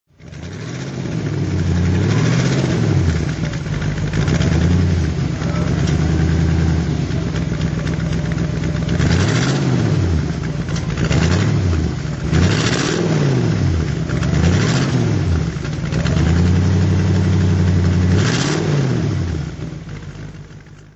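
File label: facel throttle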